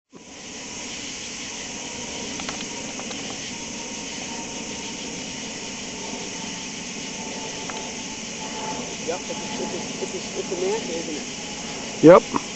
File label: UFO Sounds; Manta Ray